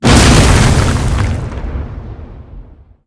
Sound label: impact ground heavy